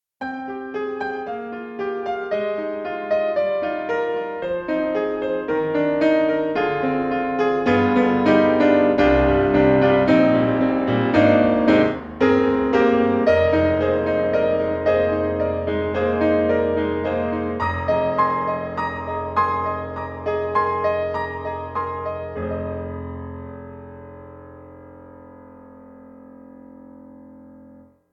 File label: Grands Steinway D Full Mic; Orchestral Tools; The Orchestral Grands